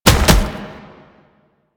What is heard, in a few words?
Gallop; Enemies; Steps; Proto Infested Brachiolyst; Warframe